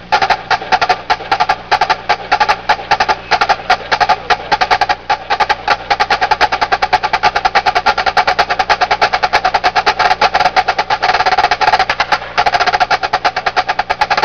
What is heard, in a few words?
ditty